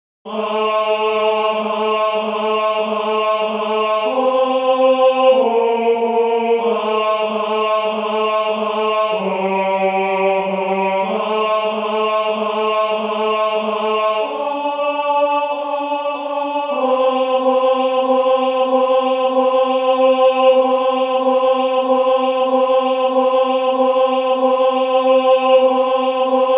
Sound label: tenor